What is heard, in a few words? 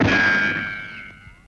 Bullet Ricochet Ban, riccos